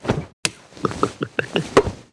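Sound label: eating drinking; MiniGames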